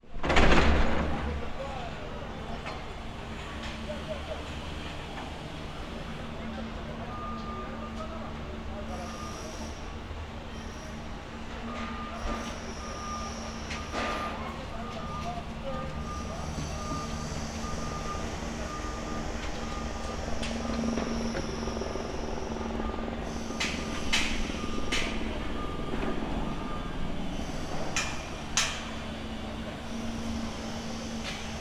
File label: Construction Site Ambiance; Let's Build! A Building Site Sound Story and Soundboard